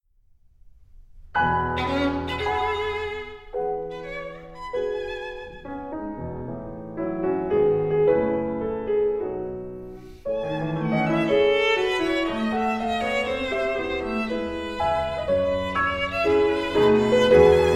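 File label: violin sonata; ropartz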